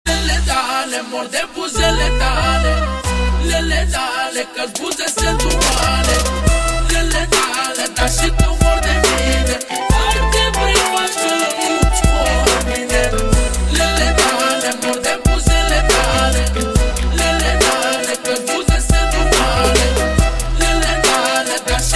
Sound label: Gypsy Trap